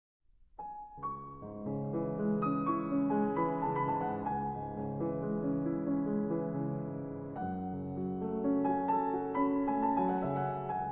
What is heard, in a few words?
Piano Pieces; 3 Piano Pieces, Op. 34; No. 1, Yö Meren Rannalla